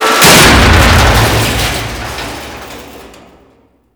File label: Crate Fall; Gameplay; Warframe; Corpus Infested